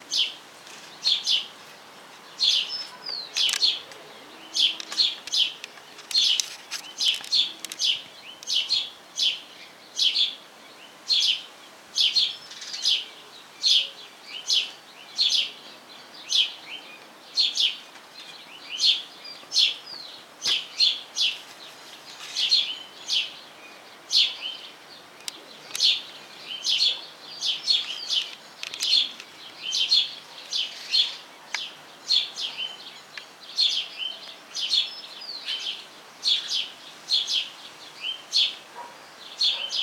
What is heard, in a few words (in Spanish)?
gorrión voz; aves